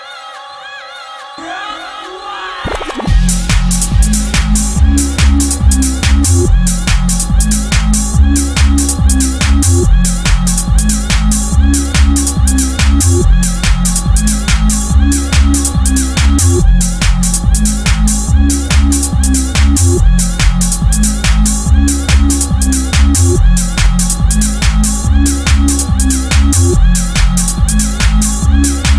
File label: Future Bassline